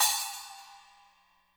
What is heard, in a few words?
HAT, KLANKY KIT